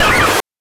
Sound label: BANK2 SFX, NES DPCM; MIDINES